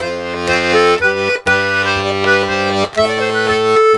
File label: Accordions, 120 laride